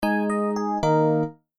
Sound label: Windows shutdown